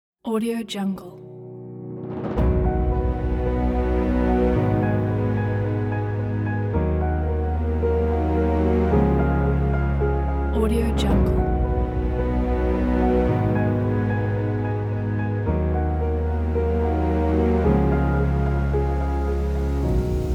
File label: epic cinematic trailer; cinematicoceans